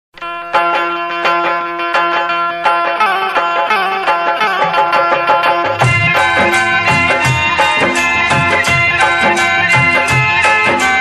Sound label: Insttumental Female Version; Bholenath